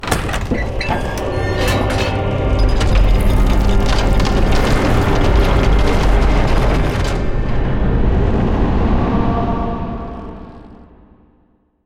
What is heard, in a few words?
Altar opening; silent hill homecoming; ps3